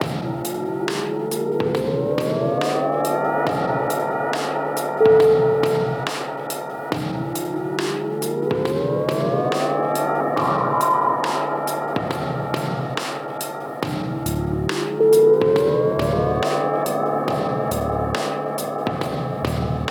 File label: alien landscapes